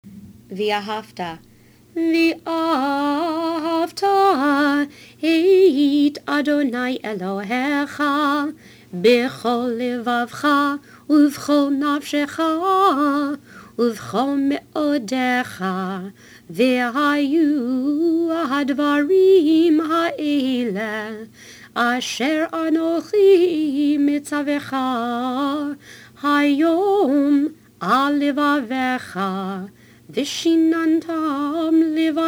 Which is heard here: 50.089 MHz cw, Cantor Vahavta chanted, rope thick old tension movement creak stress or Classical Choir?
Cantor Vahavta chanted